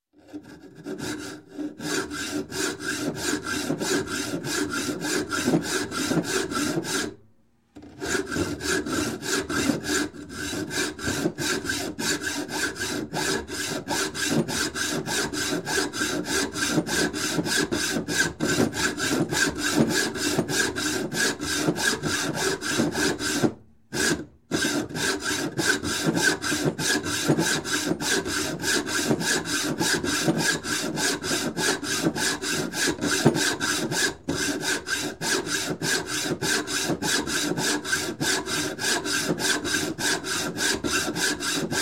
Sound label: Construction, Saw Hack Saw Cutting Metal; Sound Ideas 2000 Series